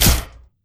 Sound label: Rocket Hand Jump; grabpack upgraded; pp3